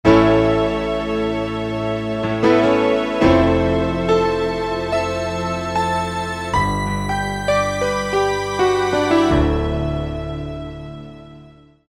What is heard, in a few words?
Yamaha TG33